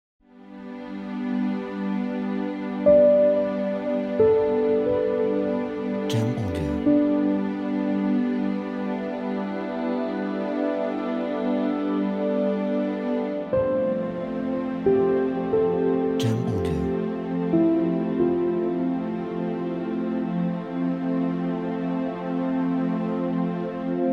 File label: Spa Ambience